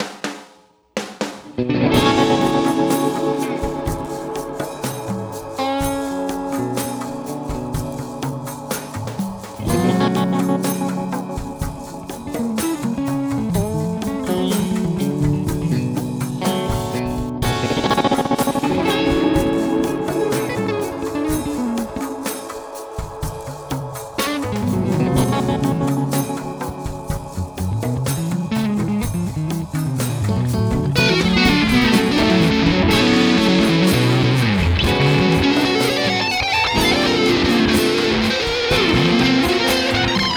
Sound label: Strat